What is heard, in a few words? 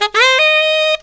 SAX